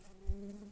Humilis workers, bumblebees

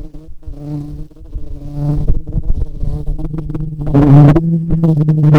Lapidarius queens, bumblebees

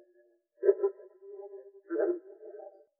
Moor Frog

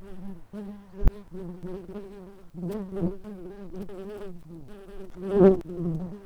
Humilis workers, bumblebees

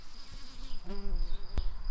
bumblebees, Hypnorum workers

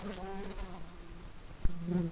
Pascuorum workers, bumblebees

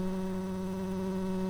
bumblebees, Hypnorum workers

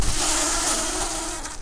bumblebees, Pascuorum queens

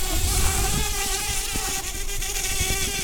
bumblebees, Pascuorum queens

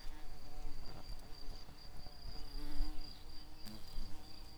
bumblebees, Lucorum queens

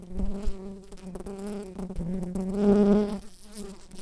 Pascuorum workers, bumblebees

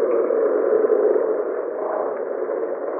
Common or Grass Frog

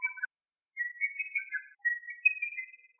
Lesser cuckoo

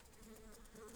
Humilis workers, bumblebees

